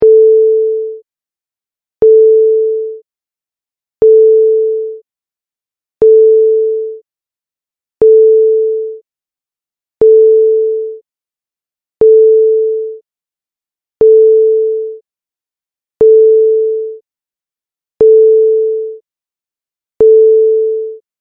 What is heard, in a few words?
Warning Beeps Slow Speed; Beep Sound Effects